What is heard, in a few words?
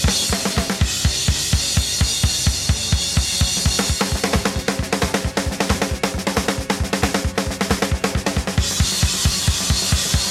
Drums Ending